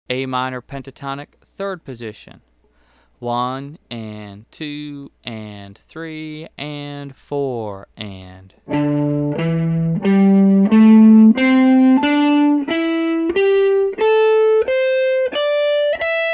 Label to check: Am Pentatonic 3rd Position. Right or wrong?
Right